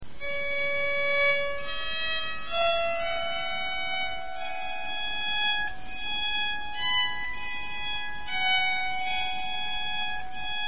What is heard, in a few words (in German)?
streichpsalter